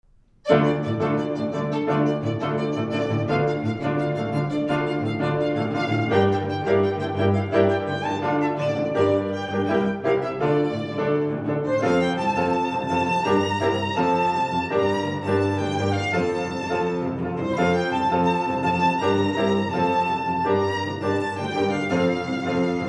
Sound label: Piano Violin